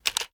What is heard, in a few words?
reload start; gmod